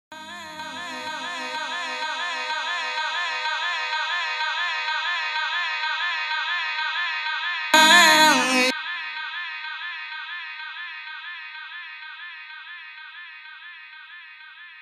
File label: chords tool